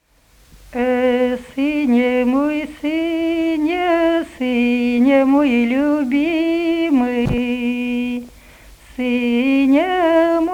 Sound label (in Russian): лирическая; А сыня мой, сыня